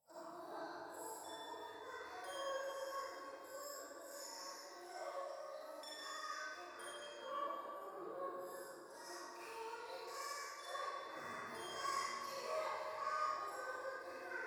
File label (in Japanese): 夢の中 低音喘ぎ 寺